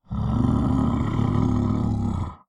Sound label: kagouti warning; skywind